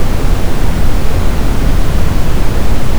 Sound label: brown noise; synth